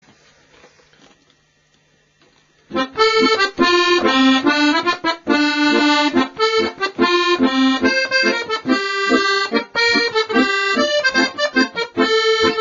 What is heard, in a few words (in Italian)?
La Buona Traccia Strumentale